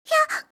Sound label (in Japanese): hya